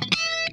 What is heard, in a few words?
SQUASH HIT, Funk Guitar; 90 s Sample CDs; Zero G